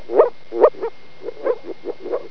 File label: Rana arvalis